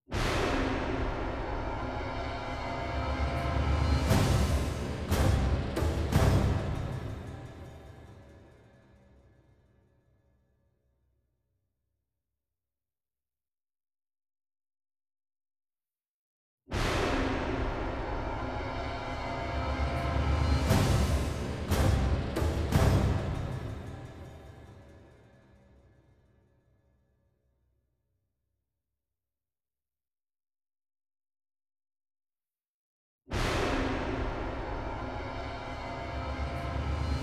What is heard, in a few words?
Negative Event Greek; Troy Musou; PS3